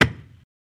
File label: Axe Chop Wood Smalle